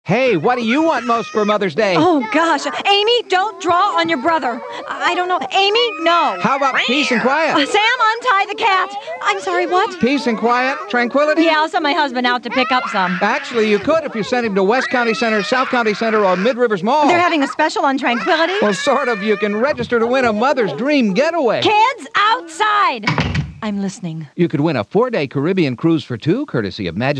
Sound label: Noisy Kids